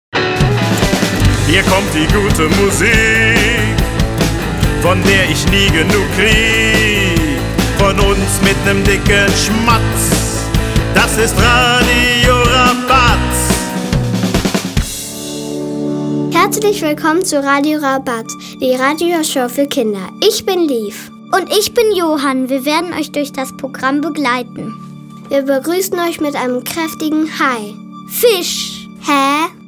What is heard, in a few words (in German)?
Radio Rabatz Jingle; Lila Lindwurm